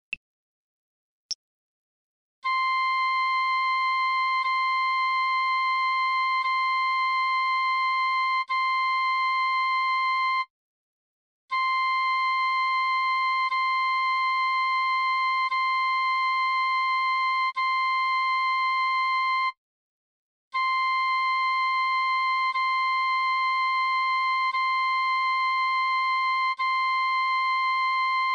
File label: c flute